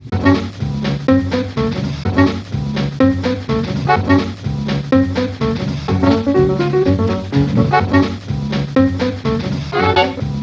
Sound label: swing sample main 32 Beats